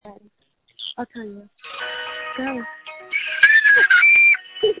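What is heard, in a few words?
Scream, phone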